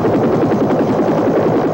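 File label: HELICOPTER, VEHICLES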